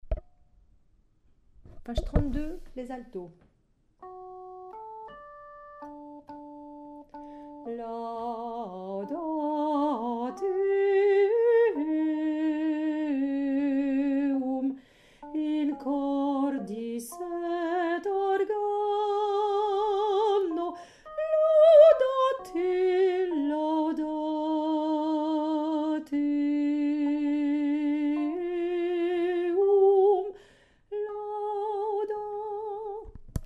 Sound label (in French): psaume Alto